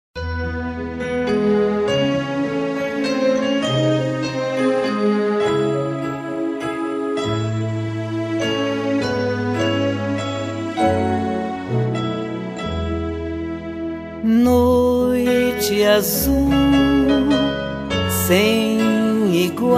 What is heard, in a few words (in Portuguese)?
Coral da Gente Meninas Cantoras; Coral e Orquestra; Meninas Cantoras de Petrópolis